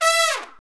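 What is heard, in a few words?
brass, Tpts FX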